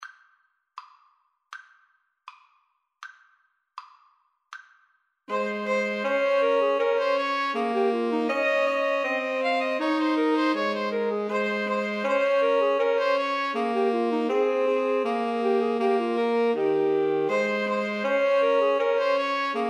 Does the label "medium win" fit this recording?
No